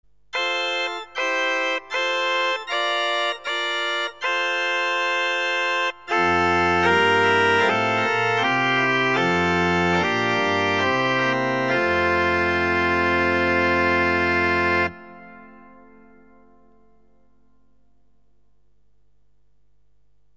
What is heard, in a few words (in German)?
grossmix chamade 8, sakralorgel